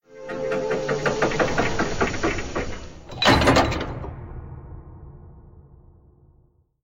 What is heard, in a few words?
big door open